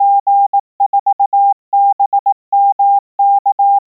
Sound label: Morse